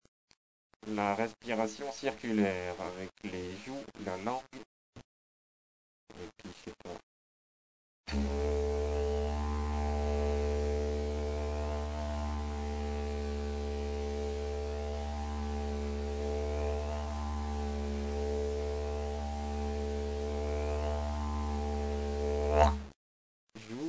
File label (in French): respiration circulaire